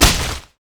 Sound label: basic attack; leona skin04